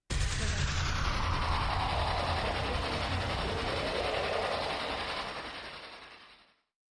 magical reverse energy; soundscrate

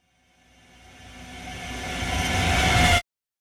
Creepy Reverse Riser; SoundsCrate

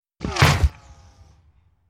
ricochet stone; soundscrate

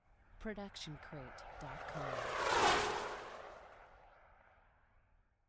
missile fly by; soundscrate

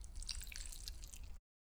stylized squish; soundscrate

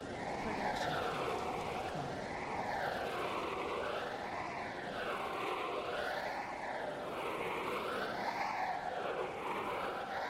anime aura wind; SoundsCrate

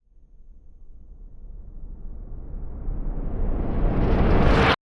Fast Horror Riser; SoundsCrate

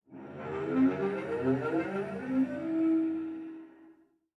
Cello Slide Up; SoundsCrate

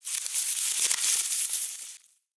ruffled leaves; soundscrate

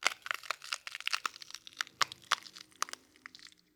cracking

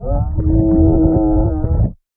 underwater scream male long; soundscrate

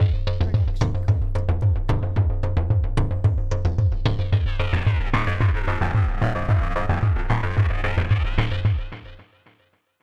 groove logo

